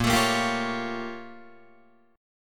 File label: Bb Minor Major 13th Bb, Guitar, DADGAD; BbmM13 strummed